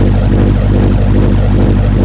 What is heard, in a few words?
eng hum; RedLine demo